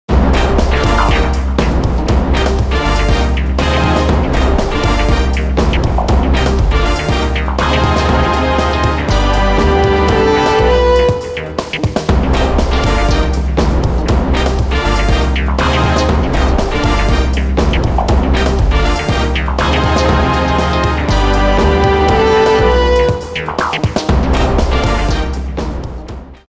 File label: Radio beat box